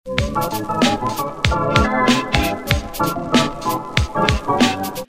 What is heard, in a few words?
dirty bounce instrumental